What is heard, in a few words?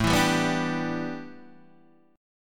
A 9th Suspended 4th Guitar Open D, chord